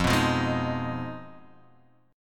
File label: F Minor 7th Flat 5th